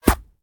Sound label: colt hit; firefight